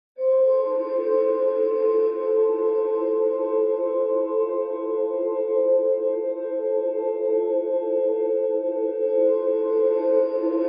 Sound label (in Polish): toy piano, Pady; Muzyka Ilustracyjna